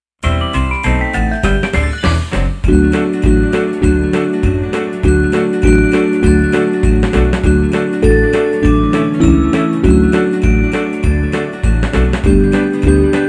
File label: Jingle Bells